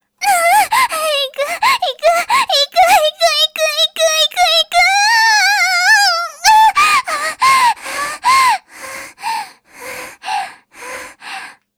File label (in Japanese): 絶頂, 淫乱系 音声素材; Honey Drop